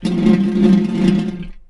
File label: sound design effect metal object spin drum fast; sound effects one